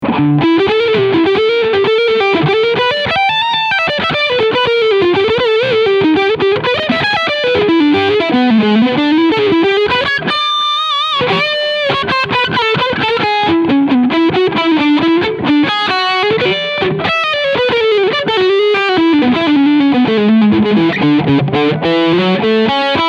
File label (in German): Harley Benton EX 76 Classic GHW AN Overdrive Leadsound Steg Pickup